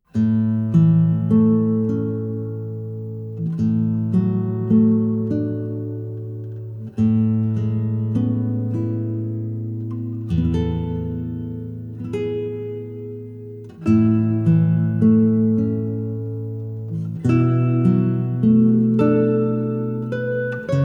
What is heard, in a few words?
guitar ver.; Tetsuwan Birdy DECODE